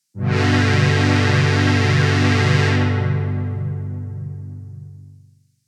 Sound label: Pad; j106; Just A Pad